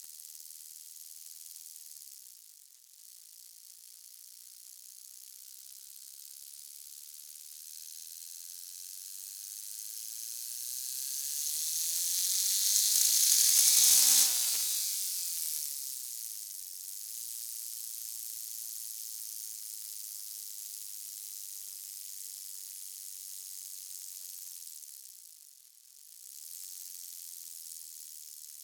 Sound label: knock; evo dyno tuning results